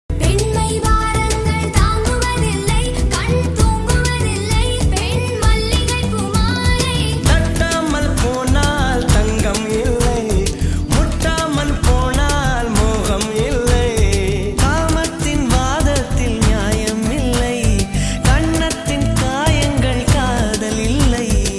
romantic love

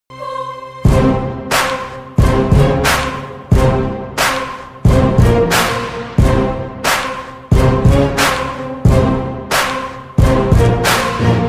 mankatha bgm ringtone

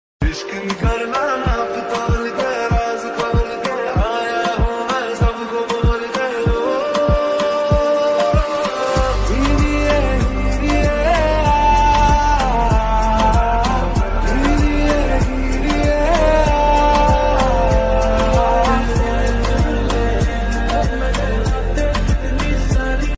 remix ringtone

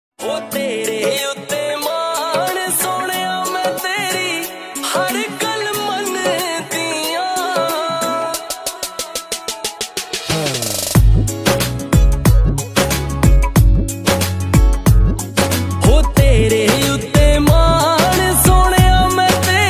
true love ringtone

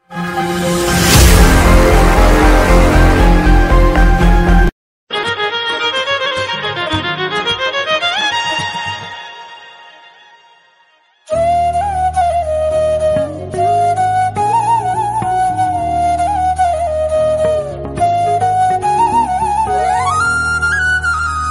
love bgm ringtone

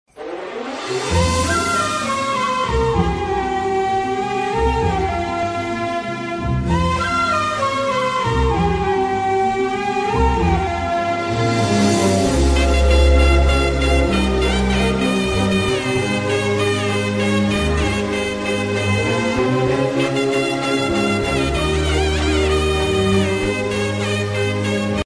love bgm ringtone, tamil love bgm ringtone; rajini murugan

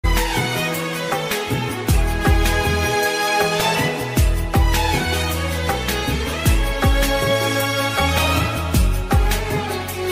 flute instrumental ringtone slowed reverb; ishq murshid drama; attitude music

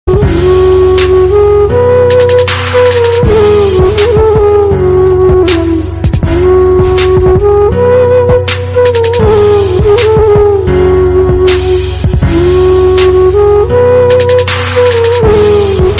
flute cover instrumental version bgm; adipurush; prabhas

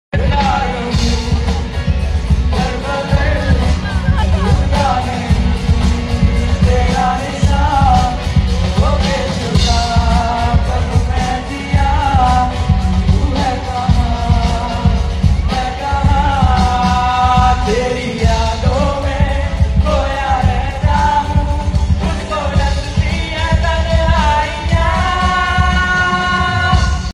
live performance music drums drummer

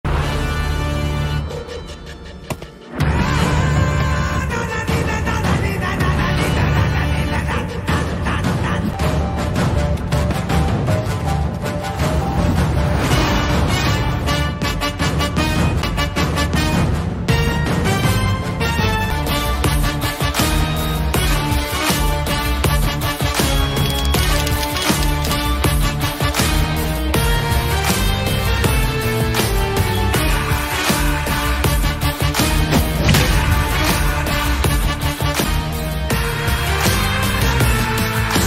background music; ramarao on duty movie; new south movie music

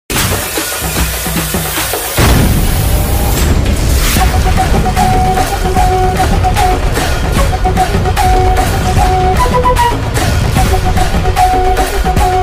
theme background original score bgm; maanadu; tamil

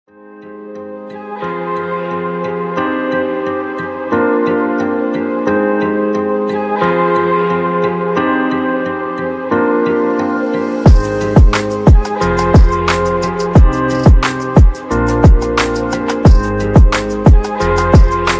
vlog background music

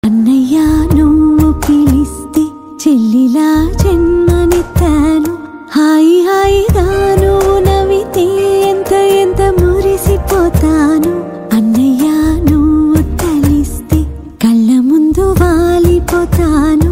song, brother and sister emotion; bro movie; kr music beats

